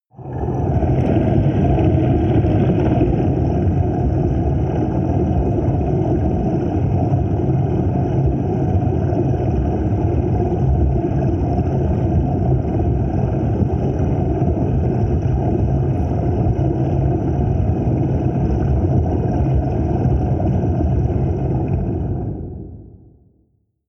water fx dark

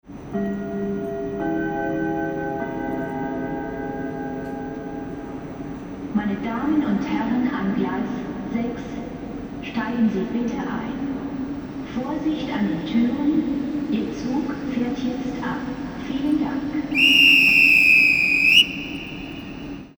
railway station german

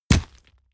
horse step dirt

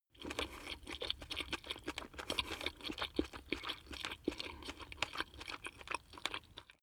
chew spaghetti fast

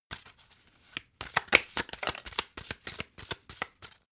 playing cards shuffle regular

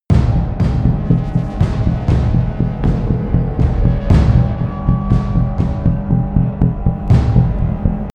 promo pad

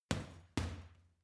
boxing punching bag uppercut double soft

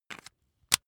razor rickety fold up

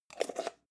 jar plastic midsize close slow

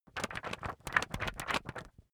magazine wobble

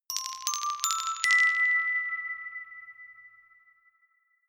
notification glockenspiel rattle sequence ascend

